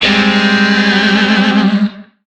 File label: Shawzin Phrygian Chord K; Music; DE; Warframe; Lizzie Shawzin